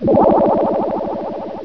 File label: Disconnected; SonicTheHedgehog